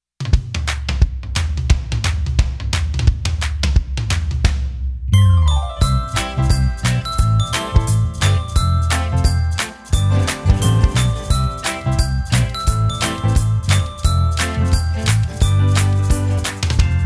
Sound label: Being Happy 180bpm; Purple Planet Music